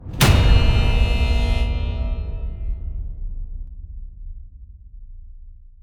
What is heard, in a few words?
Before Gate End